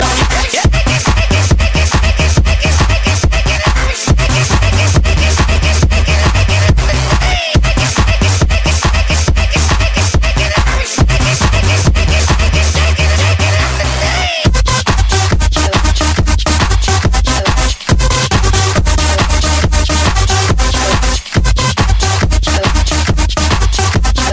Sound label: Electro Hard Beats